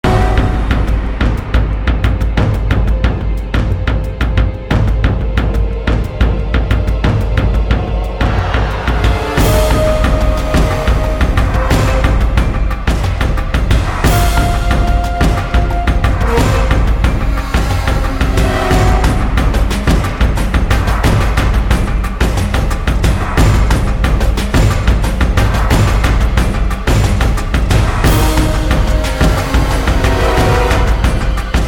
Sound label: slaughterhouse fight loop; painkiller overdose